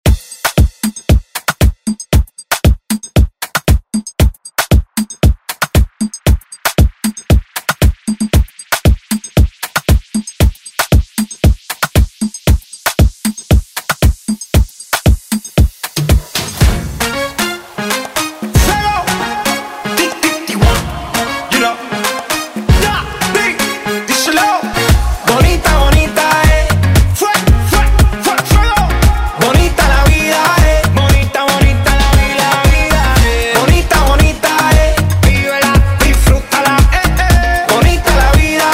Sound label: Latin Urban Redrum; Deejay Hit